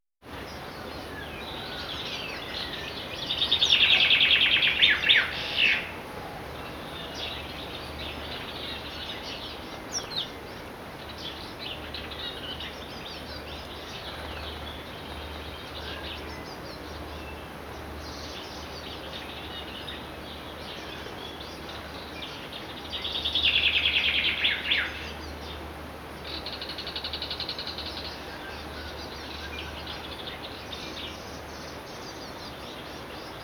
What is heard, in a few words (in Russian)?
dnevnaya pesenka zyablika